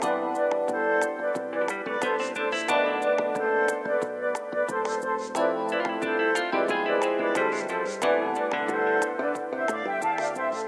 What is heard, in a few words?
annoying elevator music; autonomy lost